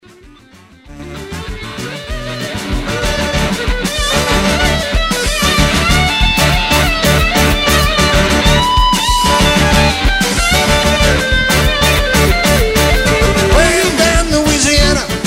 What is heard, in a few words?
Zydeco Boogaloo